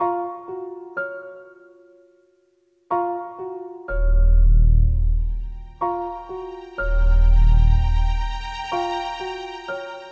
BGM; Adventure Player; psp

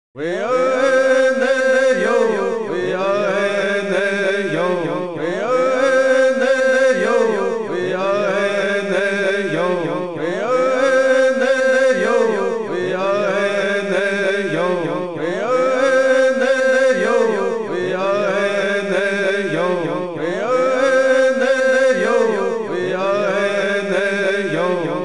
Totem Poles (Isolated Chanting); Dinosaur Planet; N64; SwapStone Circle

